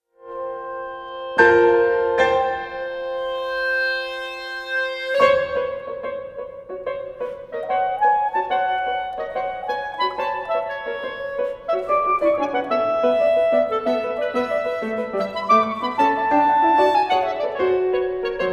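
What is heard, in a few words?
sonatina for clarinet and piano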